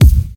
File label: punchy kick sample C key